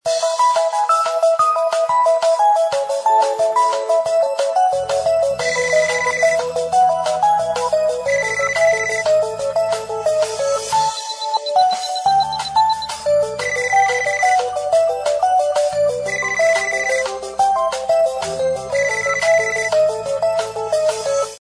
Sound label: Happy Call, Ringtones; LG265T Banter